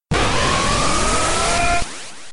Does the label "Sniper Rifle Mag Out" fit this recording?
No